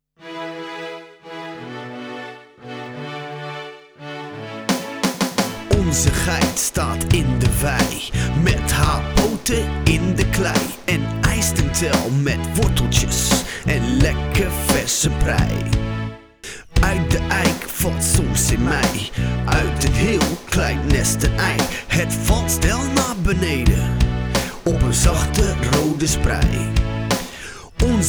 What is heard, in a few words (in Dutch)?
staal ei rap